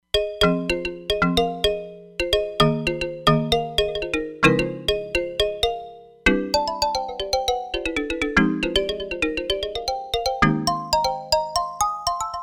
Ethno Keys, Roland Fantom XR

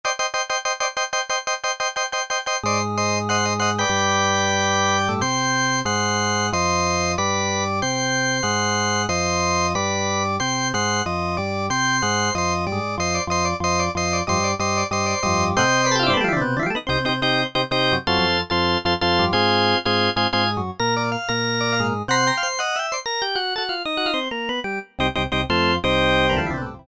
Farfisa

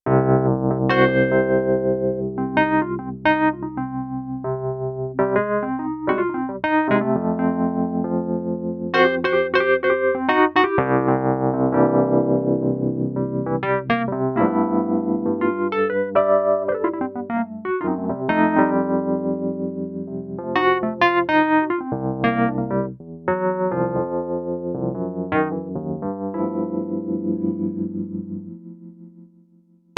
Funky Wurly

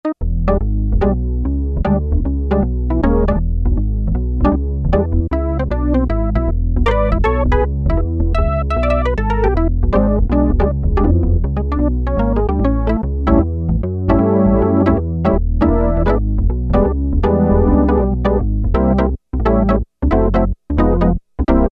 Synthesizer Organ, Roland Juno-60; Factory presets

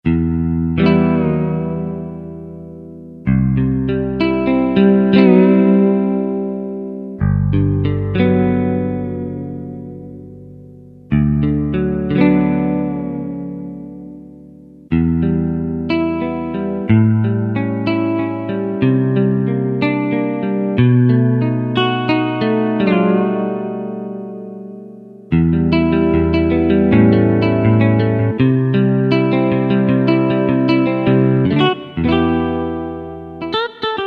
60 Clean; Yamaha YPT-400; Voices

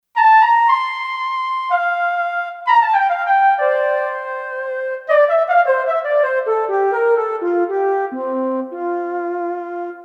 Fluty Lead, Kurzweil K2500X; Factory Programs

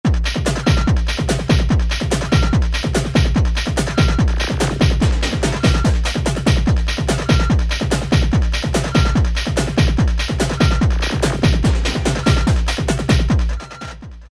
Psychedelic, Korg ES-1; Factory Patterns

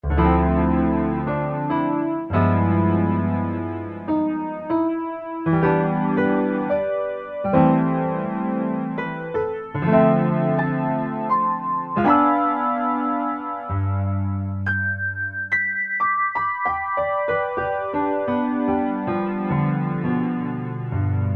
Piano, Casio CSM-1; Tones

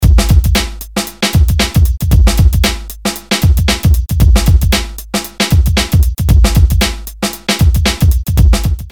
COOLHOP; Bram Bos

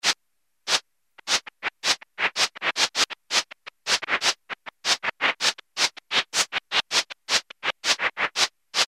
Shaker, Roland Juno-106; Factory Preset

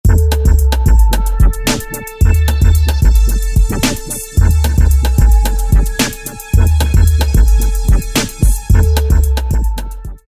R&B

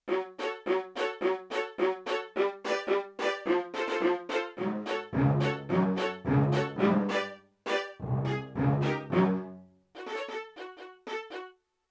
Short Bows, Yamaha TX16W, Violin Orchestra

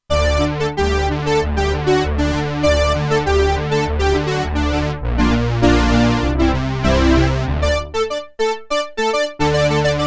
Fat Synth, Roland Alpha Juno-2; Original Sound Chart patches

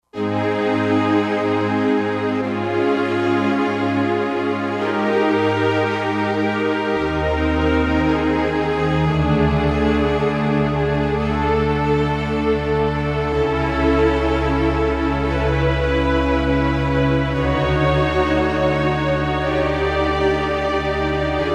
Silky Strings, Roland JD-990; Factory Patches

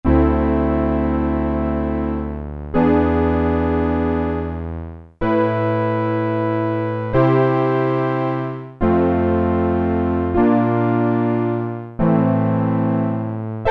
OB Cross, Korg Poly-800

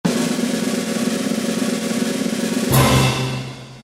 Presenting!, Roland SR-JV80-02 Orchestral

